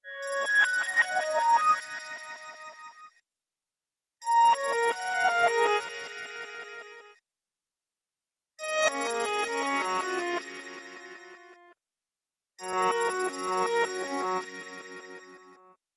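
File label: Message Ringtone for Smartphone; ringtones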